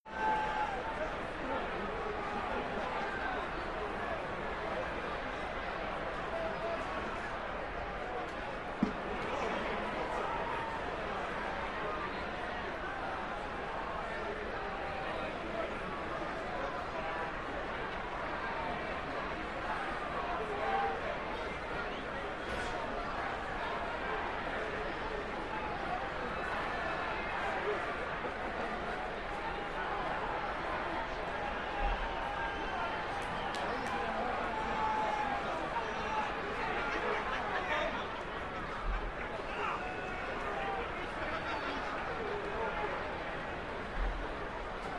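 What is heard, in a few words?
Arena Crowd Ohs Cheer; sports arena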